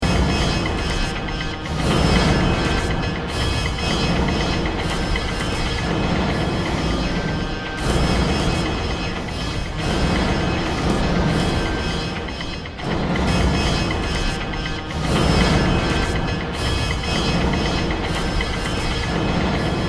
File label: street scape